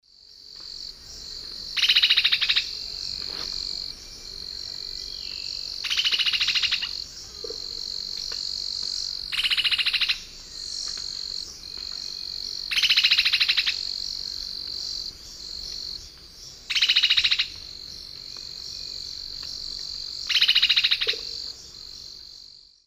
Myrmeciza fortis, alarm call; S. America; Eastern Lowlands

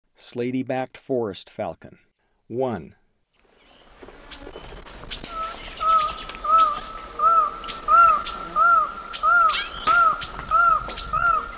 Slaty-backed Forest-Falcon; S. America; Eastern Lowlands

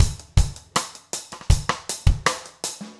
trip hop rims

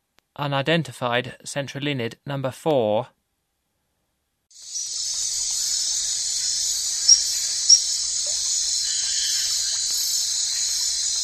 centrolenid, Frogs of Ecuadorian Amazon

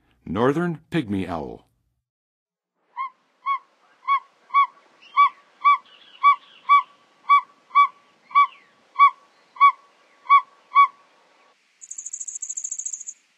Northern Pygmy-Owl, Birds of North America; Western USA; Western USA Songbirds

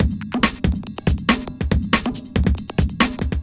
ind 140 kit

